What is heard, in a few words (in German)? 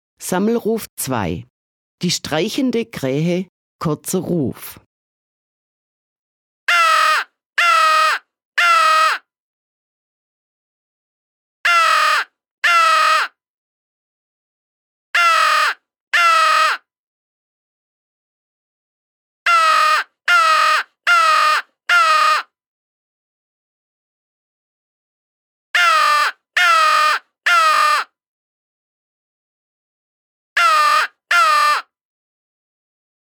Sammelruf, Die streichende Kraehe kurzer Ruf